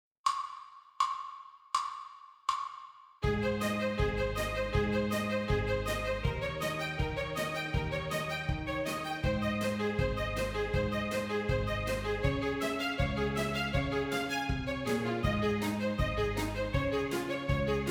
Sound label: Articulation Fun